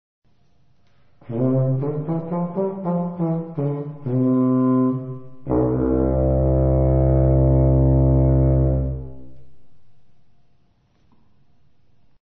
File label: serpent